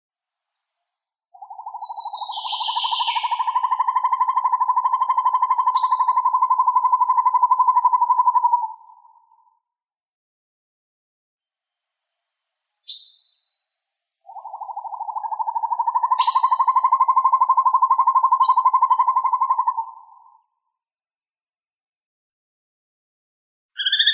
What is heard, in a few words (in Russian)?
sova yastrebinaya surnia ulula, zvuki prirodi